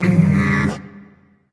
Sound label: tongue; smite; ahmuzencab